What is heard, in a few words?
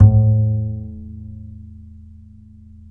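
DB A2, double bass; Balkanish Traditions; USB Soundscan vol.63; AKAI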